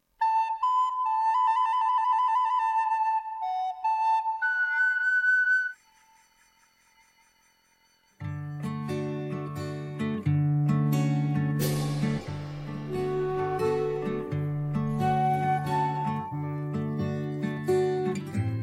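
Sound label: backing track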